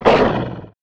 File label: Puppet Wolf Dmg; FlyForInsanity